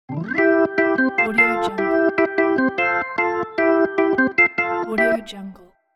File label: rock b3 organ transition